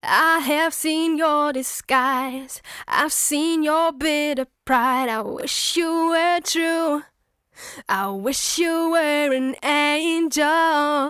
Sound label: voxengo overtone geq, Vocal; Free Vocal Plugins